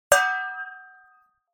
Metal Pan Ping Hit; Hollywood Edge; sound designer's toolkit v2

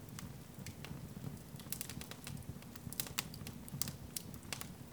Fire Campfire Loop; Destruction

